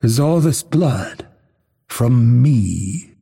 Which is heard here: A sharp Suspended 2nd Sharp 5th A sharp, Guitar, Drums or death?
death